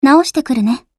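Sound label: attack; hibiki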